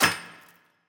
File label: Shrapnel Hit